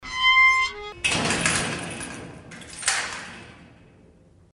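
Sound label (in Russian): gate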